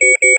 warning beep; Tribes2

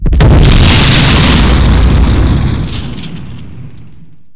vehicle explosion; explosions; Tribes2

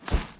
snowfall; environment; Tribes2

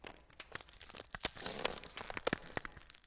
ice crack, environment; Tribes2

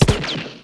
cg soft, weapons; Tribes2

